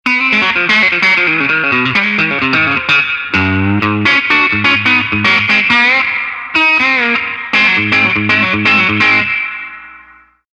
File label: country; tone demo